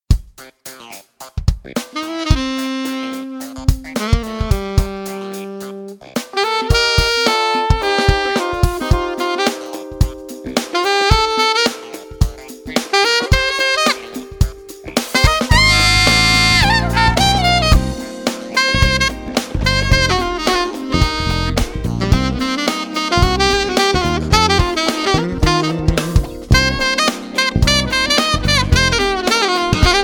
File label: Funky Alto Beyer M99